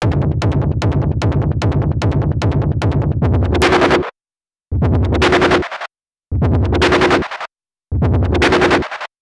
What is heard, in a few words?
birmingham hardcore